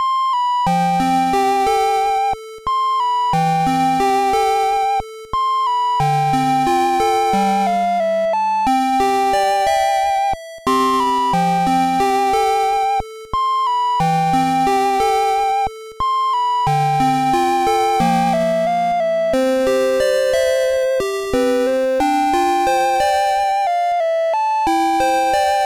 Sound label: 8Bit Theme loop; TP; Ilias